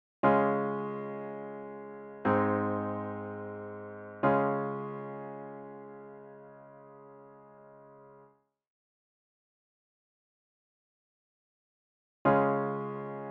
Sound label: III flat Cadence